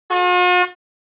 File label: Truck horn honk single short blast